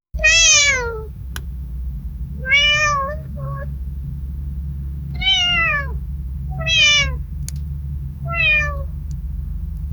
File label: sad cat sound